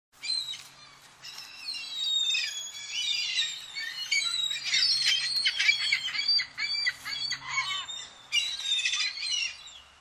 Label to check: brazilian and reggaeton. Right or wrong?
Wrong